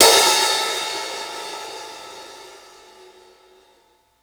60s OPEN HH, HI HATS; Basement drums vol 2

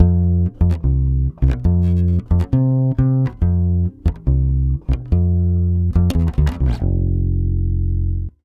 140 BASS F7, Brazil Bossa; USB Soundscan; AKAI

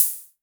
Hi Hats Open; Drums; LIMAT Div Dept

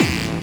hard bass drumz

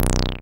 TB C2; Best Service Gigapack 1; SYNTB303

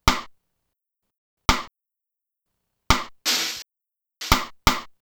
Rim Shot Beat, Trip-Hop Loops; Sonic Foundry; Rondo Brothers

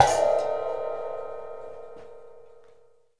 Sloppy Toes, Percussion, One Shots; Essential Percussion

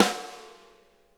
RIM, snare; Sonic Reality; Interactive Drum Kits

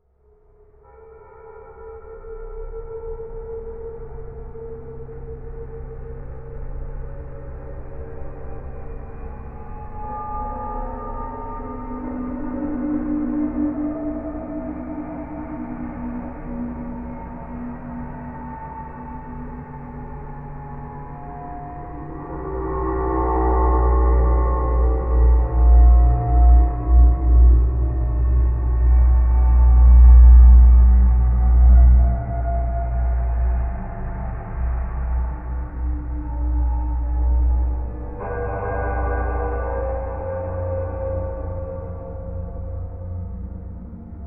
Waterphone Bow Slow one shot; Sonic Foundry (Sony Creative Software) - Ambient Atmospheres & Rhythms; Ambient-Metals

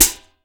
14 hi hat, flange hi hat

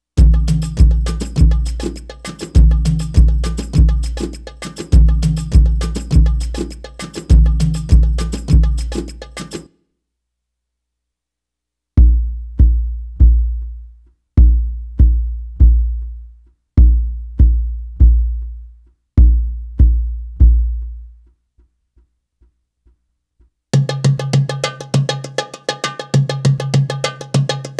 Arabic Rhythms

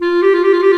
THE CLARINET

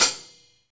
Rock Closed Hi Hat, Roland BK-5

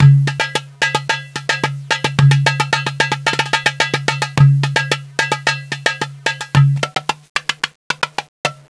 Darbuka 110; Sonic Foundry; Hip-Hop Street Beats Construction Kit; Ethnicity

